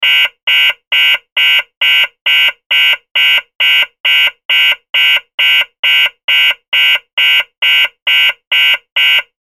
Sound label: alarm buzzer sound